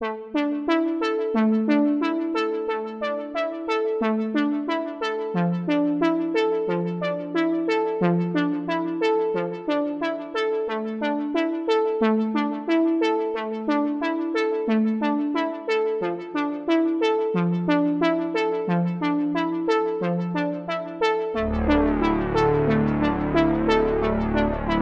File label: Path Intro 90; Wise The Wise Path 1